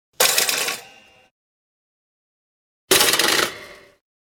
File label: Toaster Pops, 2 x springy Sounds of toast popping out of a toaster; Appliances; Household